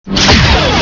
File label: lasar fire; graal2001